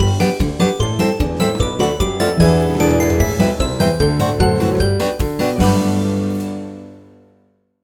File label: Free Spin Intro; magic maid cafe